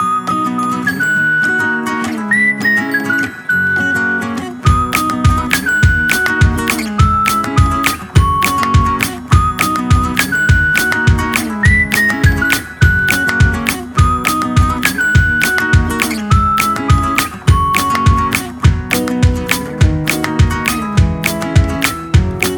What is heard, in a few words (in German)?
Jingle 2 Gitarren